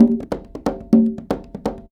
Percussion Loops, Bongo & Conga; Houseworx

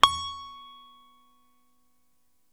SITAR C#5, CORAL SITAR; East West; Steve Stevens Guitar Samples Collection

